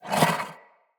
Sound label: Wyld Blind Shot; Fey; Saboteur